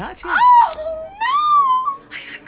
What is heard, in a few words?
female laugh